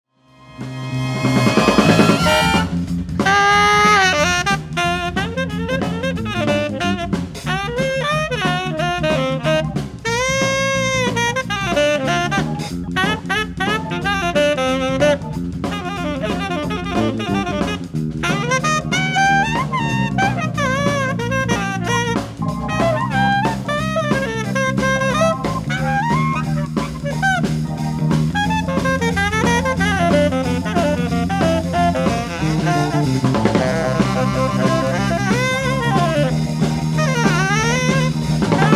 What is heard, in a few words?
Pop Solo